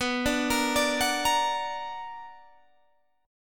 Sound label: B Minor Major 7th, Guitar, Open G